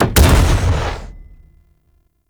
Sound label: Siege Engine Cannon Fire; Weapons; Enemy; Artillery; Ode Siege Engine